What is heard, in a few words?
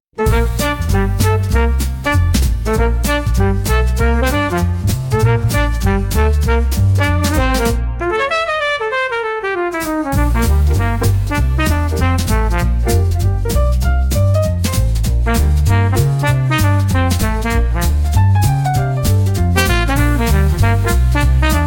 Brass big band swing